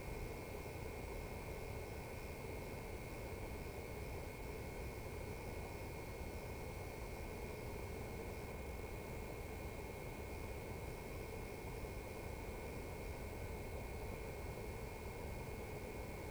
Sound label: mac studio fan noise; Mac studio v1 fan noise - it's going to be one of the loudest Macs at idle.